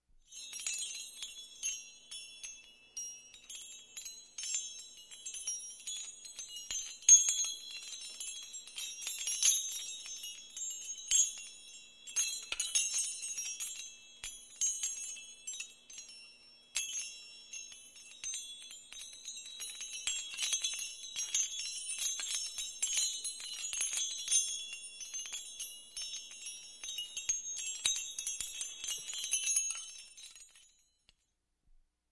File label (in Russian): Звон колокольчиков которые колышутся от ветра